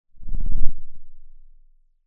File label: pop